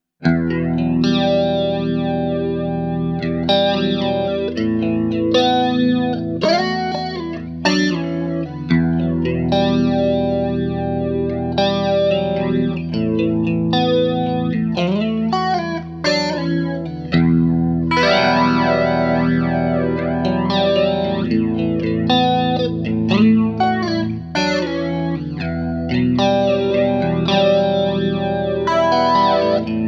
bias flanger slow

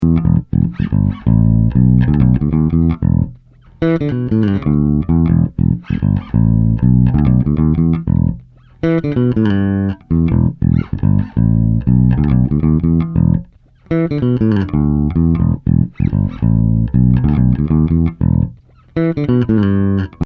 Bass; Two Notes Le Bass

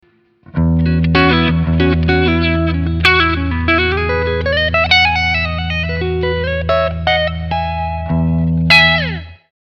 Clean Front HB

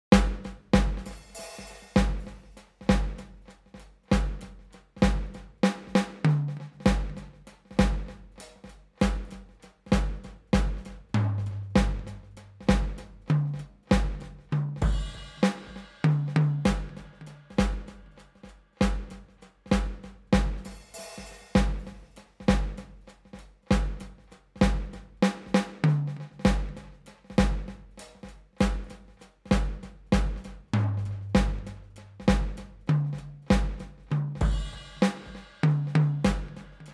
TC2290 DT Drum Flanger

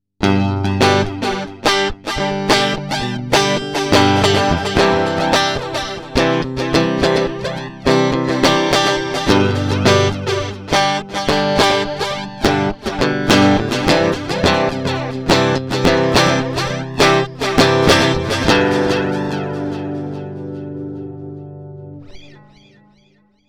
Neve 88M Strat DI Echo

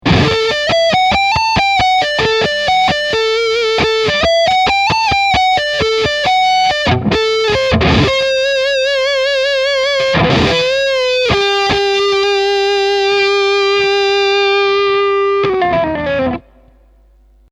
High Gain Lead Neck

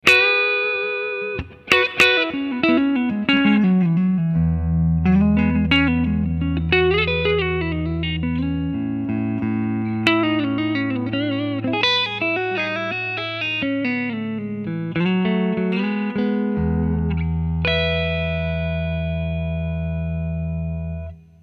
Front HB Clean Picking

